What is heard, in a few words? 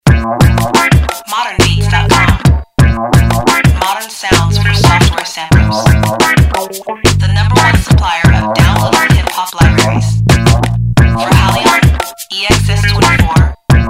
Guitar; GuitarElementz; modernbeats guitar elementz bundle